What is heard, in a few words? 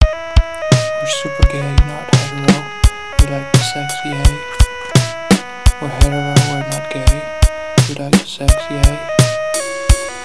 scot hip hop